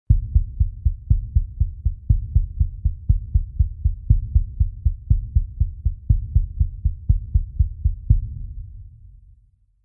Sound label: heartbeat